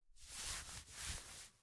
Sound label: Put Hands In Pockets; LOOPERS